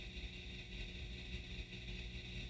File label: floppy sound fdd5 motor empty